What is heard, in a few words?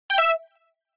Correct